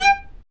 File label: Low Marcato; Roland L-CD702; Combos 1